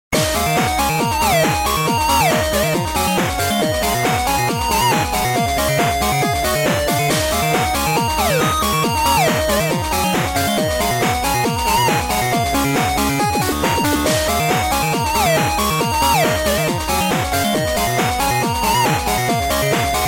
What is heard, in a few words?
8 bit